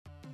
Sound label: fail